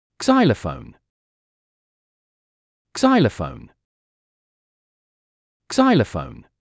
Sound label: Xylophone